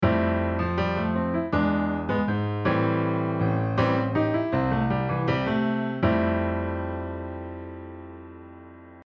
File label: oshare rhythm